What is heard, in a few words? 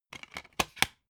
dvd case put in dvd